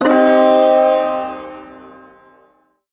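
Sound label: Shawzin Minor Chord; Warframe; Duviri Ersatz Shawzin; Chords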